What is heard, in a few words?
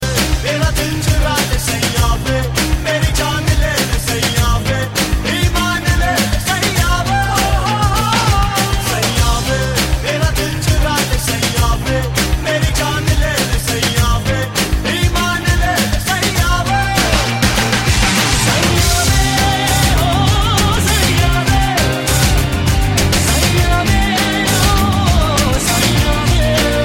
Rock